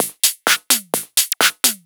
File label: Straight Loop 128 BPM, House